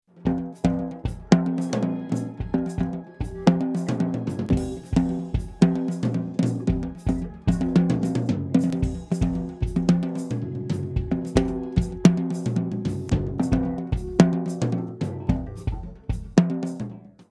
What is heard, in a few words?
Portico II Depthmin EQ switched Width0